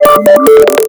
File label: Tech alert; Motorola Droid-4